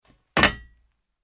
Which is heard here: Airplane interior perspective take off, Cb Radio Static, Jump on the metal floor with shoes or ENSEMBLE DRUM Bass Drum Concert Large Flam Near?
Jump on the metal floor with shoes